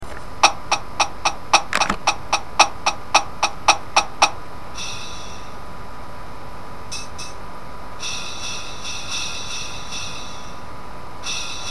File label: cymbals